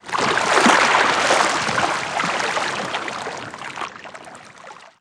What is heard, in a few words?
water lap